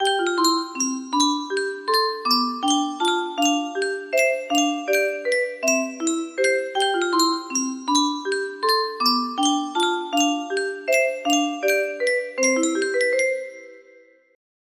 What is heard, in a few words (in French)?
yunsheng boite a musique